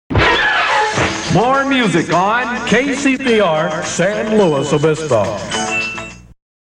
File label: Summer IDs Jingles